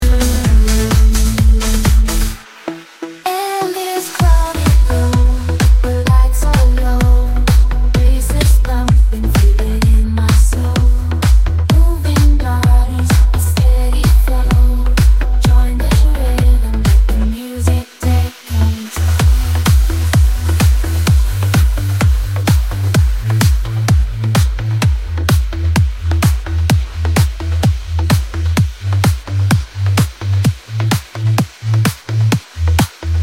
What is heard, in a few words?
Electric Pulse